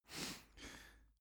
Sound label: sniff; soundpool